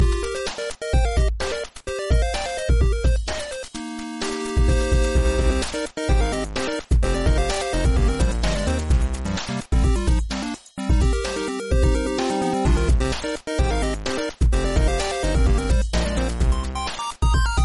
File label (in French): sms jeux video